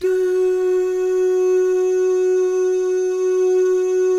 DOO MIX, Vocal Planet Jazz, GUY GAL; ILIO; Jazz & FX

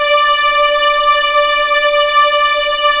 D5 EXP MF, VIOLINS; East West; The Ultimate String Collection

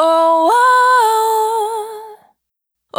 Urban Dry, Single Voice Melodic Loops

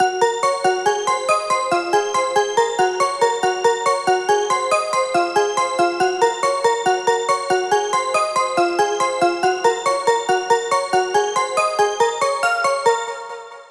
140BPM G#; Vengeance - Essential Clubsounds Vol.4

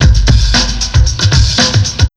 ROCK 132 loop, Big Beat Drumloops

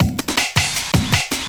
LOOP L, Drum & Bass 160 BPM

